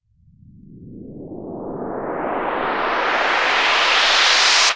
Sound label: Uplifter; Street Anthems Sound Kit